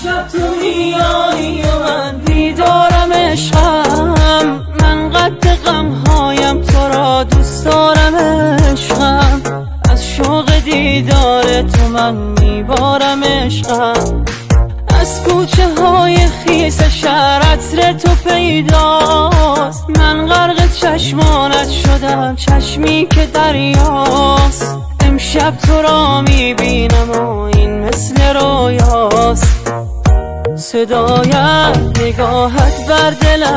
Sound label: remix irani remix